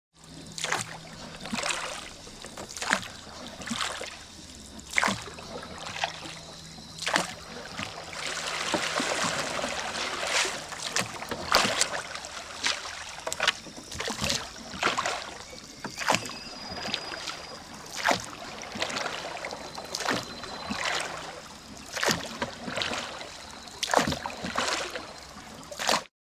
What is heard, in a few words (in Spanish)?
rio parana canoero, puerto bemberg; misiones